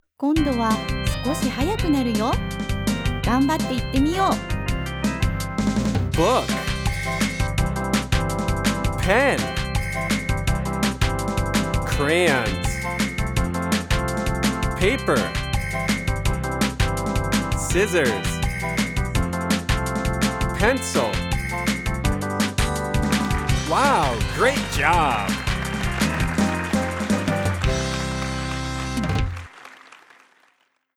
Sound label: 60's 8 beat; Kindergarten Practice